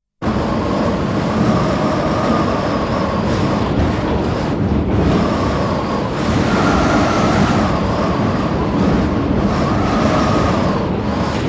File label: Polar wind, very strong & gusty; Weather; BBC